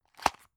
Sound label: drug open; arzt